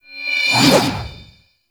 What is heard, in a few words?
Sword Melee Heavy Charge; Enemies; Weapons; DE; Warframe; Teshin